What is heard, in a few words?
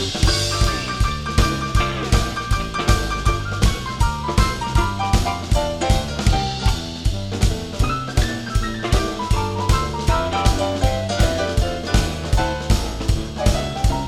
Medium Swing Shuffle 12 bar Piano Soloing